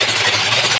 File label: saw; Mini Militia